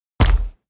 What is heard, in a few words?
stamp; soundscrate